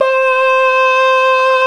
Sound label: VOX, Male Scats